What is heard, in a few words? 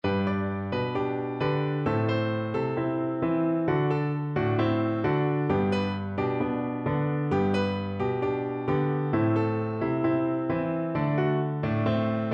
shake the papaya down viola